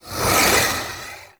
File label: Archon Whip Ranged Attack Projectile; Warframe; The New War; Nira